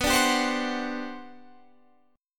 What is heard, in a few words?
G Major 11th B